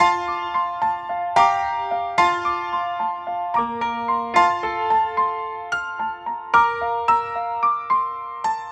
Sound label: Chime Piano, Trip-Hop Loops; Sonic Foundry (Sony Creative Software); Rondo Brothers Trip-Hop Loops & Samples; Apologists